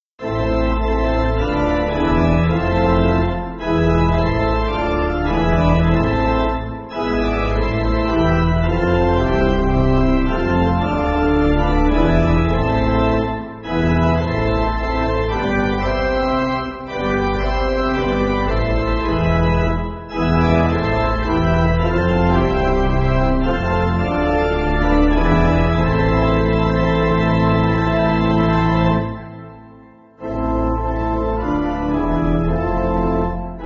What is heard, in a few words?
Pipe, Pipe Organ, Music Backing